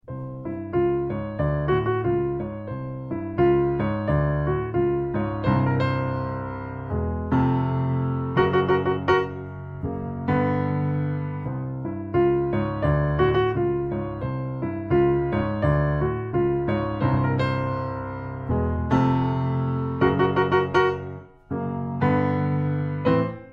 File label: piano solo